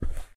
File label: footsteps carpet Walk; Poppy Playtime